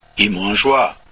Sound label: i moan